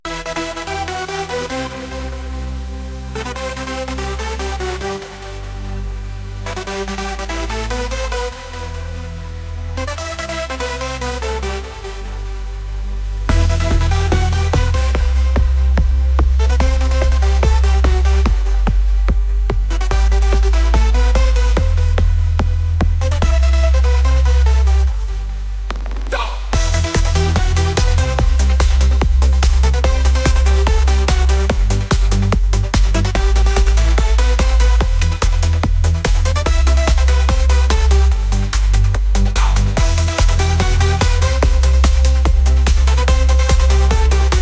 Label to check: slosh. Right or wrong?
Wrong